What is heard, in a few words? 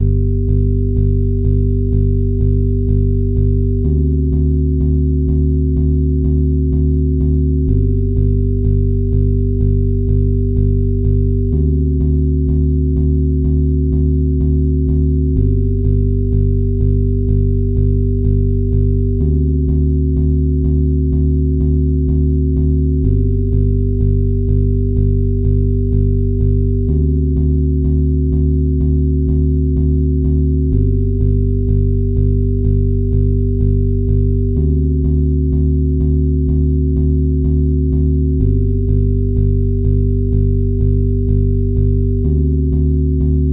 Zen Waves 1 to 5 in G; Mike Cirillo; Musical Self-Actualization; Mike Cirillo Method TMSA